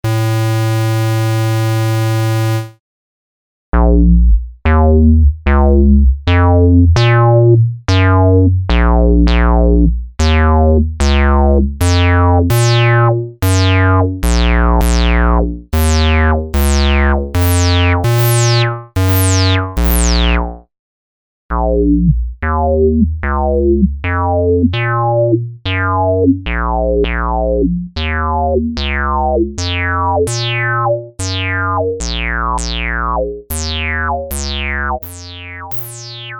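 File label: DWGS 48kHz Org Sample velocity to VCF; EIII; Q ORG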